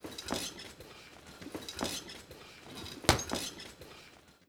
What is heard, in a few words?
boxing bag; Singles Flirt Up Your Life